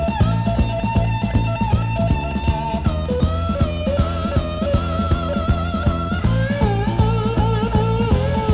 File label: slide solo